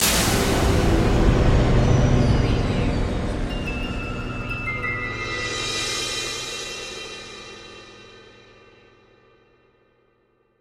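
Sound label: Production Element Stab Music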